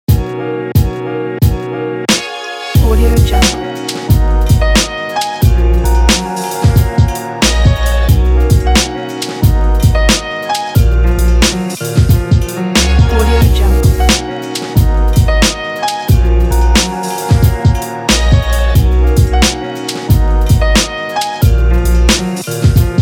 hip hop groove

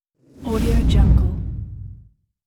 fire whoosh with short tail

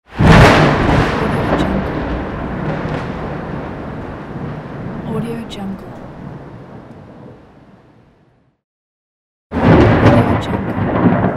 thunder claps

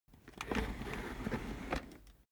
object chair office worn trundle soft

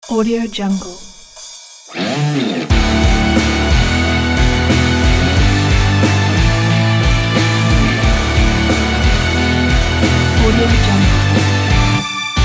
feel stronger today

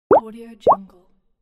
water drop bloop

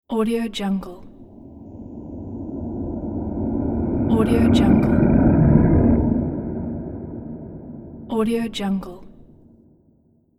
it lurks below horror; sonic-boom

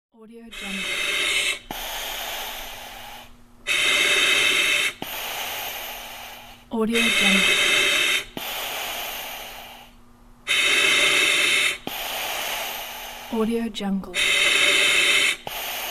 mask of the respiratory apparatus

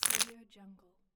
board game cardboard pieces drop

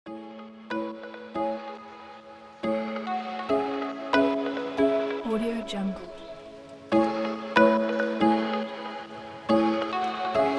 thunder poprock edm

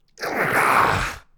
undead pain vocal wound painful movement

